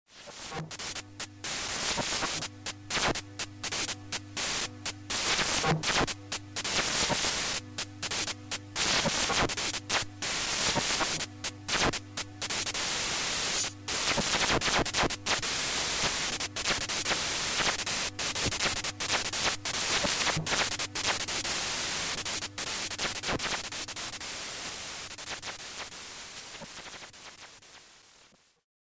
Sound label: static